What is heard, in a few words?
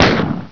MENU SELECT